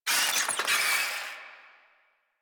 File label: Infested Ashen Vocal Attack Death; Warframe